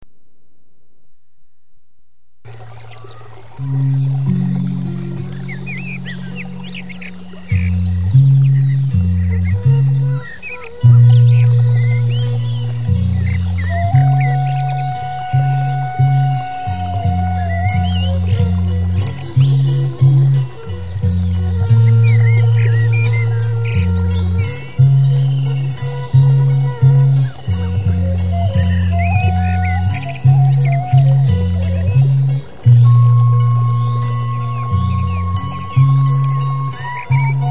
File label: harp